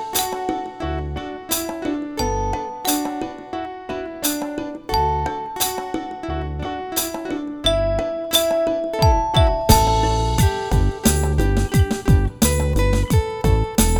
wersja instrumentalna

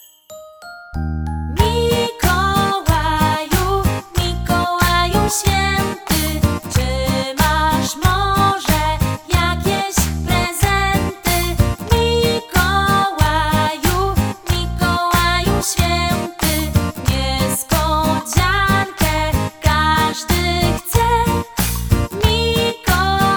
utwór w wersji wokalnej

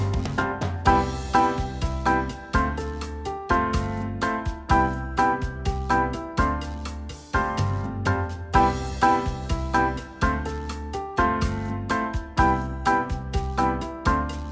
WERSJA INSTRUMENTALNA

